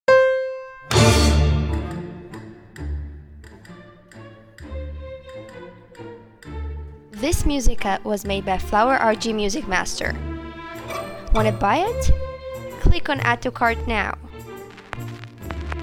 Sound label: Carmen Crazy Remix music rhythmic gymnastics